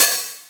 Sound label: Open Hihat